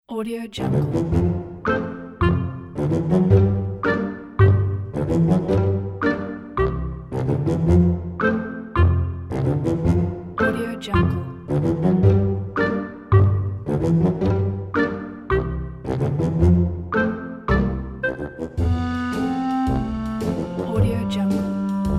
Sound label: funny moments